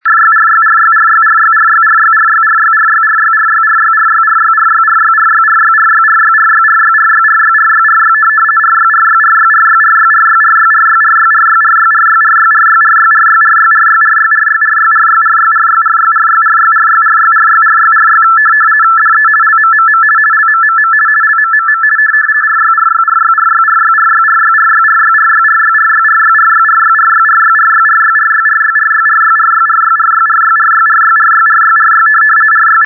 aero rtty 50 bd; luqa

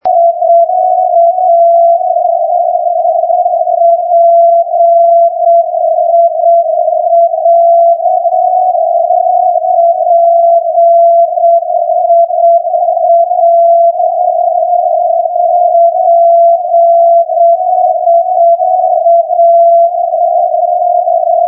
ndb piacenza-s damiano pia 440.0

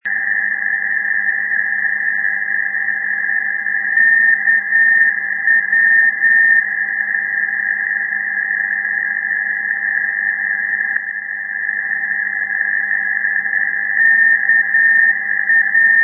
ndb klagenfurt; europe

